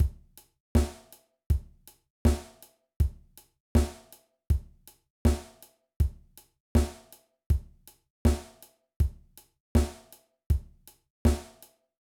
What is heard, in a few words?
Kick Drum Technique Exercise